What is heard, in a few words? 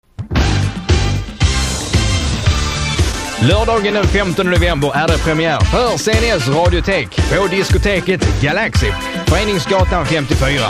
jingle